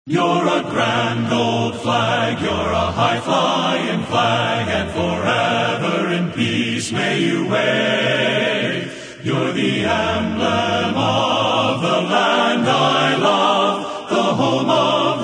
You're a Grand Old Flag, men's chorus

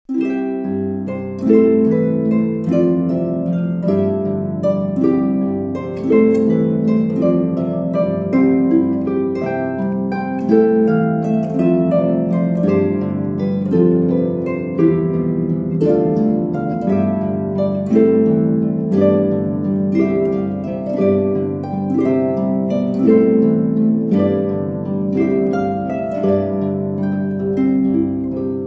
bring a torch, christmas carols on the harp

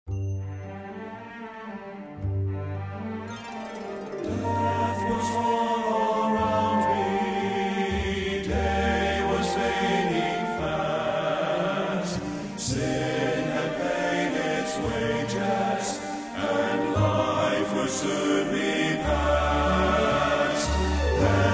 Trio, Born Again

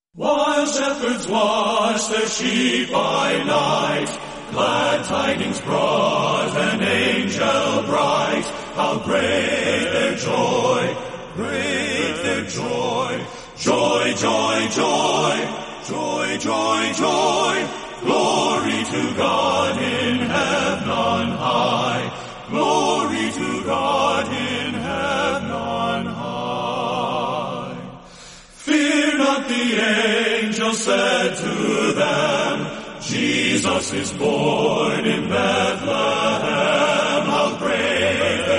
men's chorus

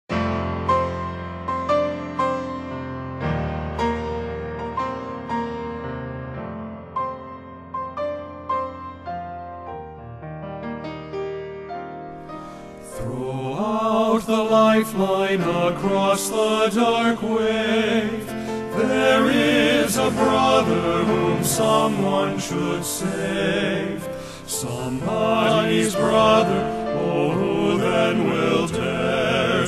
men's chorus

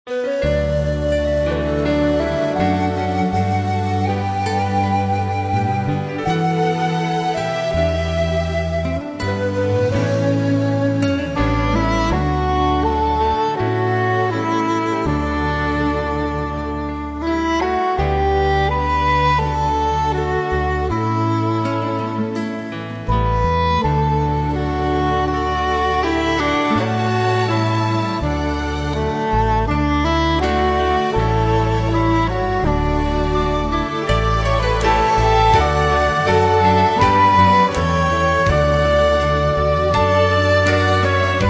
Instrumental Hymns

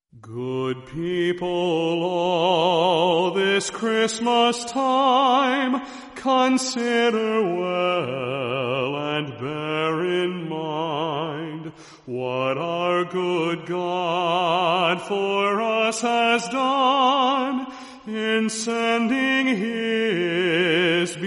men's chorus wexford carol

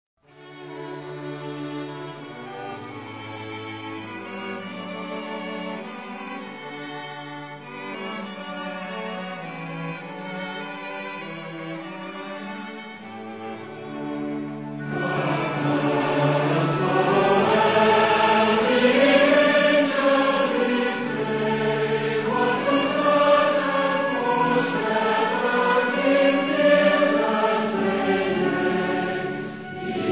Orchestra and Choir, Hymns

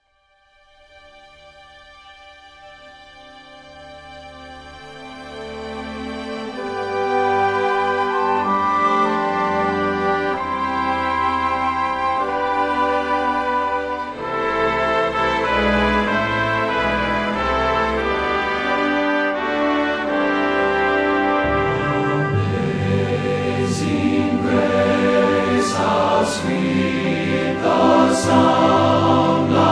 chorale

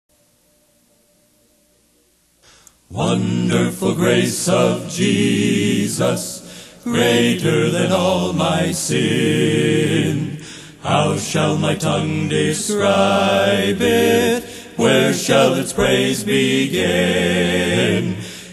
Old Hymns A Capella

